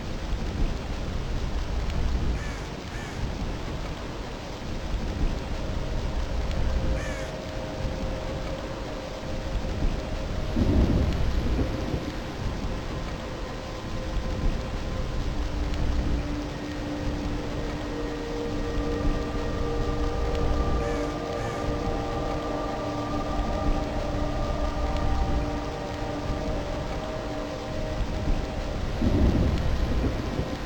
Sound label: amb